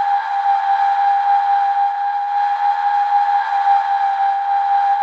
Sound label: P-51D Whistle; DCS